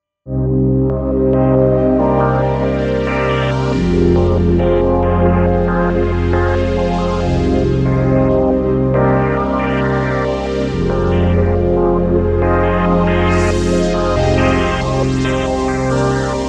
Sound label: Vintage filtered extern; Kodamo Mask